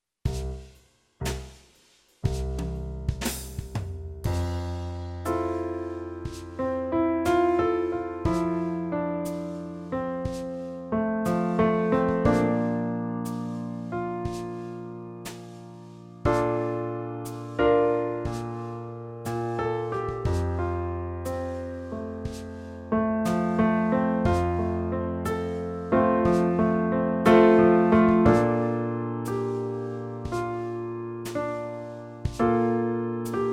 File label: Long note warm up in F; Level B